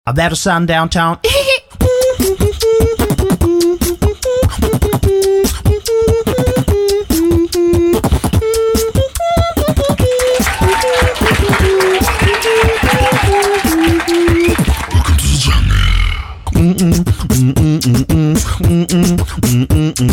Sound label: Beatbox